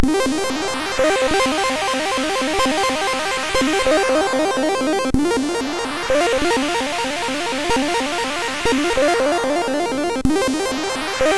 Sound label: Slow Music, MOS6581R3; hvsc